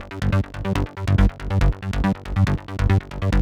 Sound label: Space Of Time Kit 140BPM Bassline DRY, G minor; Vengeance Samples